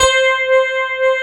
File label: FM ELP 3 C4 R, Keyboards Old School; USB Soundscan vol.09; AKAI